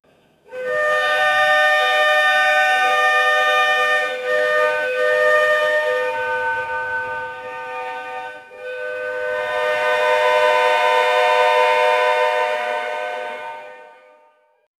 repeating ringtone using, ringtones

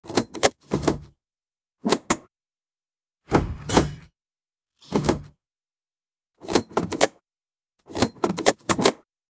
cartoon chest opening

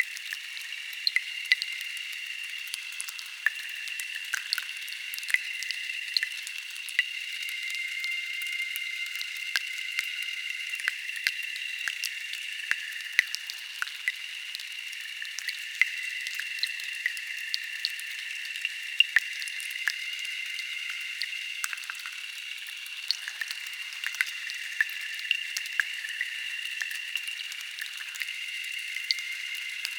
a seamless loopable cave, rock fall

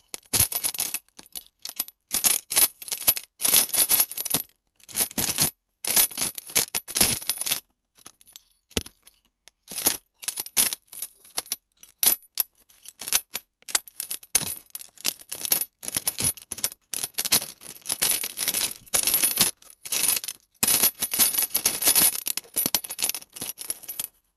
folk

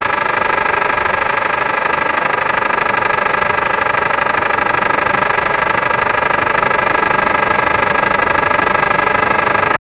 tractor sound; agriculture

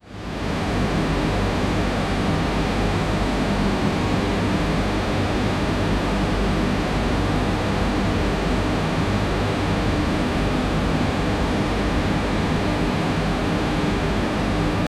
metalic sound in morning

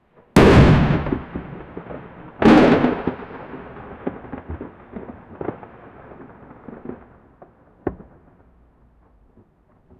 a collapsing roof surface, destruction

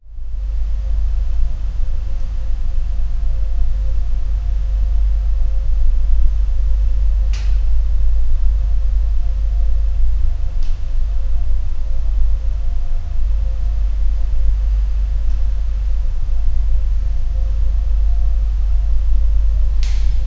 cold abandoned hospital r, air vent

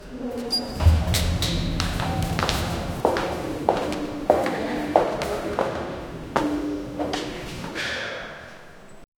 a man and a woman, boots

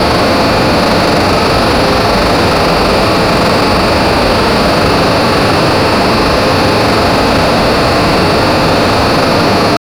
fighter jet engine sound; airplane

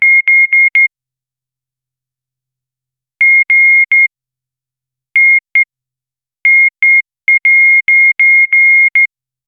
short confirmation transm

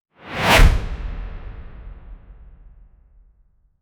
a powerful blow to the; booming

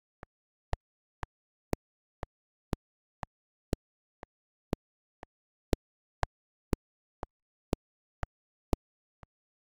soft click

